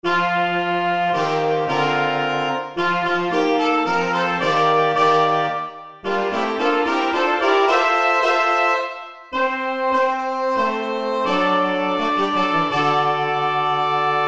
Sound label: Three Fanfares (Quartet)